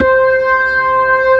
B3, Drawbars

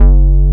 PULSE BASS

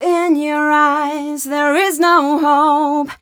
f mel d, fem Vocals; Mutekki Media; Diamond Vocals 2

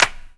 CLAP, Dance Drums

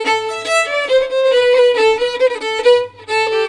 140 AM violin, Celtic Flavours; USB Soundscan vol.42; AKAI

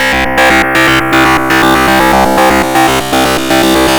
GRUNGE

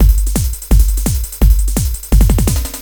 LOOP, Eurotech; Sounds Good

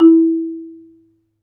MARIMBA F#4; ILIO

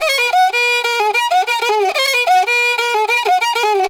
riti grooves; Best Service; World Colours

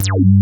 TECH REZ BS; Pro-Rec - Synthsonic; BASSES

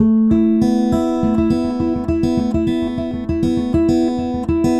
Gtr; Sonic Foundry (Sony Creative Software); Friendly Fire

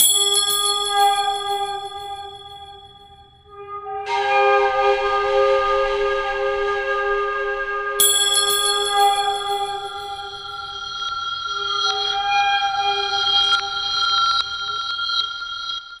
Strange Distant Flute, Ethnic Instruments; Sonic Foundry (Sony Creative Software); The Electronic Music Manuscript Collection

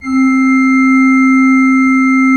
SWL C3, Cathedral Organ, SCHWELLWERK; Propeller Island

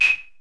808 CLV, 480L; Wizoo; Platinum 24 Electronic; 480L BW 808

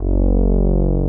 SOFT BASS; Masterbits; Dance Trax

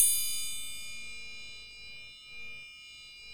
BASIC ENSMBL; OMI - Universe of Sounds

